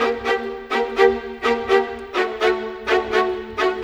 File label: Violins; Sonic Foundry (Sony Creative Software); Orchestral Series VOL-4; Rock and Pop; Rock-Pop 18